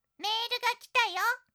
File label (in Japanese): 新着メールの通知